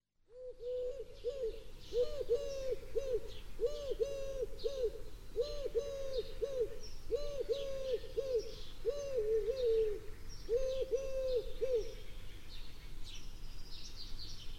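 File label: singing of a turtle dove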